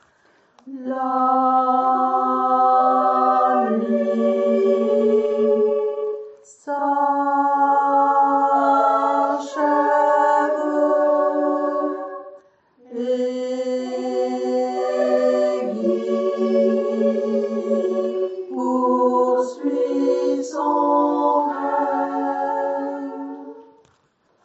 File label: tutti